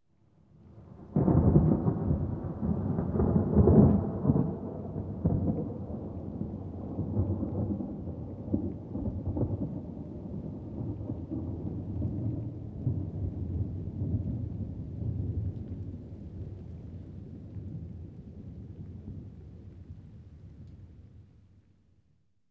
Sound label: Thunder Roll (With Rain); BBC Sound Effects Library; Weather II